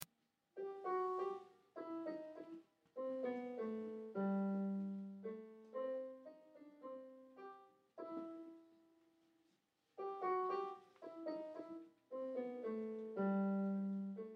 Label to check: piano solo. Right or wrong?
Right